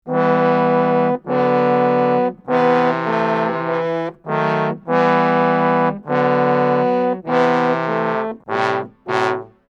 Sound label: 100 Emin Low part, Brass; 90 s Sample CDs; PowerFX - Downtown Orchestra